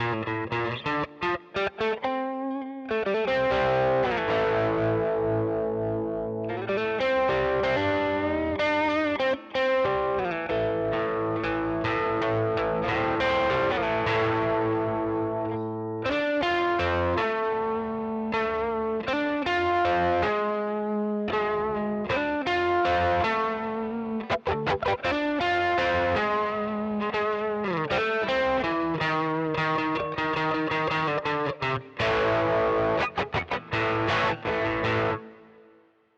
Simplifier DLX Parallel AC Brit American Lead 6L6 412